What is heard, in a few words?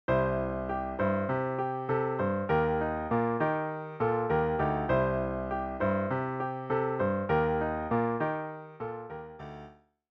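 vamp, piano rendition of the chords, C Eb; song intro; 3-Chord Vamps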